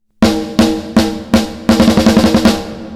Snare; HD cart

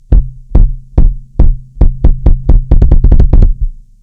bass drum caribou bass middle over

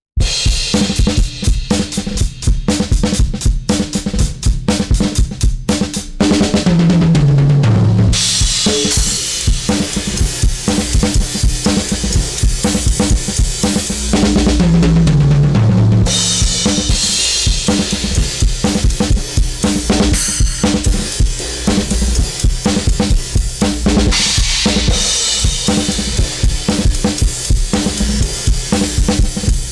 drums; Autoformer; Drumbuss

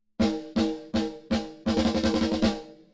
snare TONAL; SplitEQ

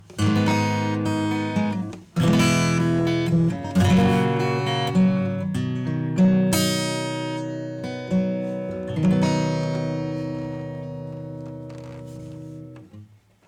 acoustic guitar; ER ACC GUITAR sweet

